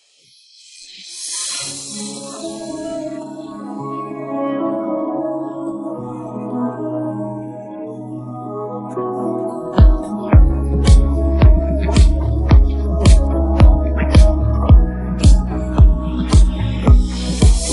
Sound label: beat, pop